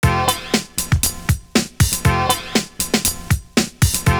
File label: SINGLE NOTE, loop; Univers Sons - Kitch 70s